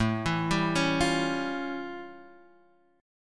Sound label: A 7th Flat 13th; A7b13 arpeggiated; Guitar; JGuitar